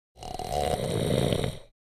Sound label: Man snoring violently short